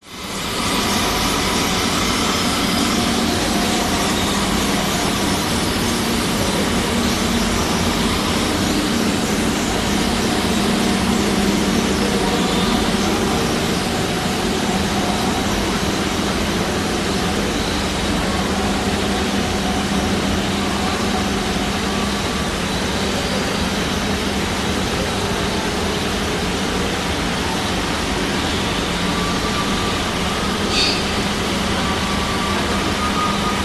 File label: public places city moving walkway autowalk subway few voices; sydney cbd australia